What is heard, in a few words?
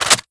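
charge handle back, weapons; cstrike; valorant; rgx 11z pro vandal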